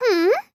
hmm

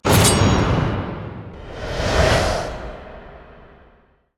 testimony

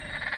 spooky

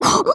cough

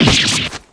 damage

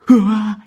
surprise

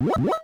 angry

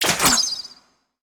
bow; tressa

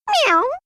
meow; tao

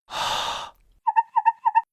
breath n rub

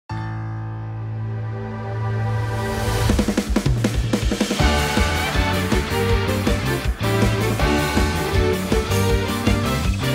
vocal solo - eng ver.

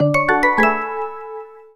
jingle

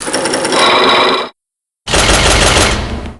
lock start

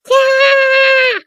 scream

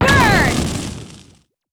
burn; komaru naegi

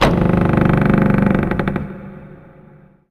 door open

